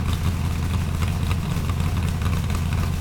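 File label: Weapon chainsaw idle loop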